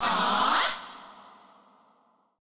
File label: CHANT